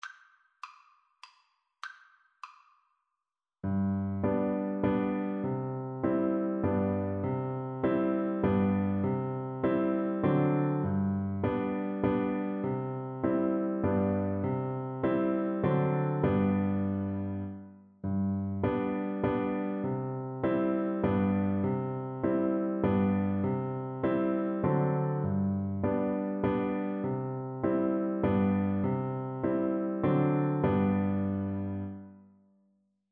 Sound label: Recorder